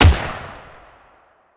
WEAPON hmg Shot

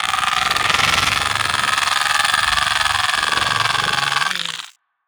pet Hungry

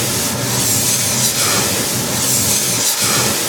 Extinguisher

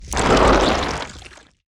CRAWLER vomit

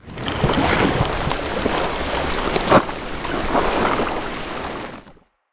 ABYSSM idle

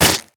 Limb Blunt

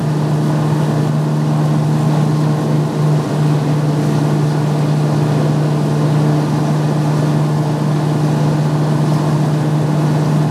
Scooter Loop

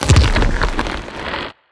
ABYSSM bite

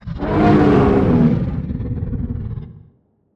ENDWORM death

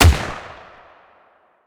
hmg Shot; WEAPON

